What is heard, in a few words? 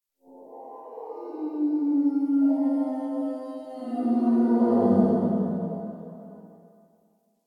Exterior Distant Calls Angry, Ambience